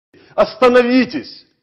SFX Pause; Escape from Russia: Mobilization

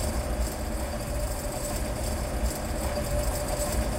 Amb Verb

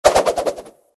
Char Saw; gamerip; The Fancy Pants Adventure World 3

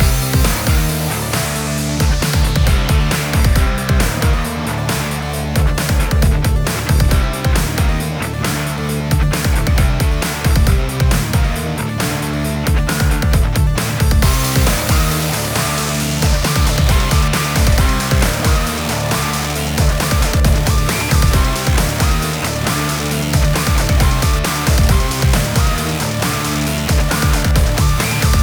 victory; MX Nitro: Unleashed

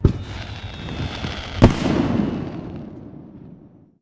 Firework

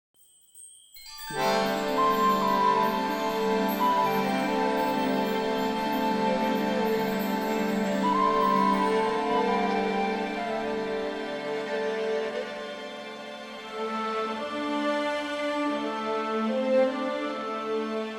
Abandoned Places Jingle; The Witcher 3 Wild Hunt; Toussaint - Living World

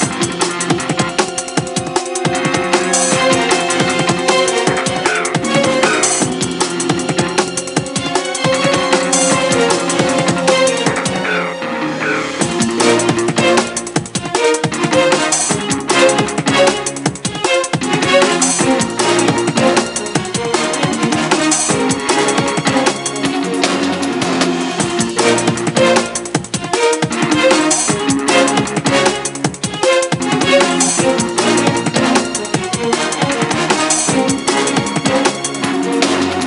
Bgm Boss; Teenage Mutant Ninja Turtles: Arcade Attack; NDS

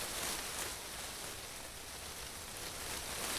Leaf Loop

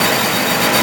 Meca Gear Medium LOOP

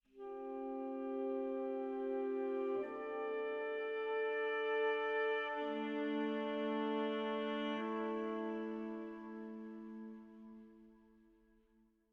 Env Sky High Altitude Melody; the legend of zelda tears of the kingdom

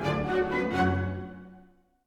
Music Act Jail Main End 128bpm

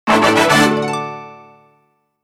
Jingle Gate Passage; culdcept revolt original soundtrack dynamix 2017

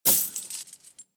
Treasure Pickup Normal; Doodle Jump; 3DS, Android, Arcade, DS, iOS, Mobile, Xbox 360

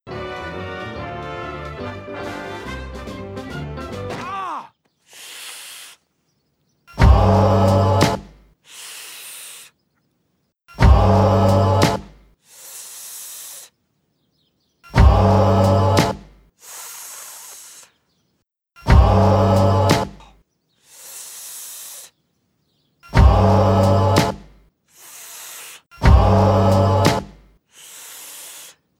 ahhhhh; timmy turners globe run 2023

